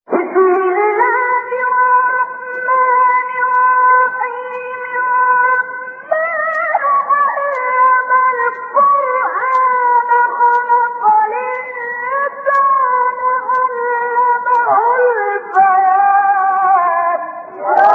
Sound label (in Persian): فراز زیبای سوره; الرحمن